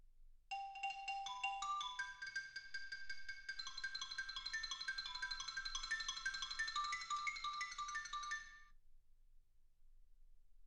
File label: xylophone